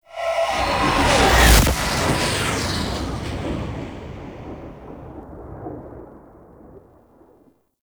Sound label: Fly By Slow, Vehicles; Warframe; Liset; Zariman